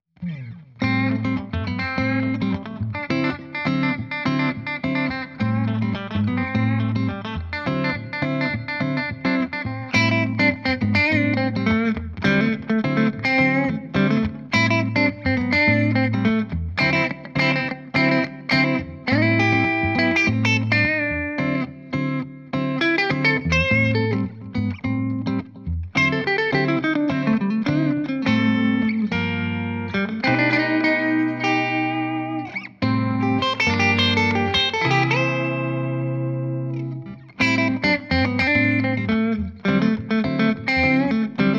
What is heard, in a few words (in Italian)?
ritmica manico assolo ponte; midle finale